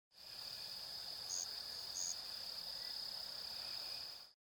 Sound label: Eastern Striped Cricket